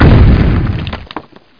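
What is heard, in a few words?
stone fall, golem; Hexen II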